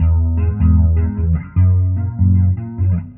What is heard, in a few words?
bass cleaning off your politic, Loops, Phonky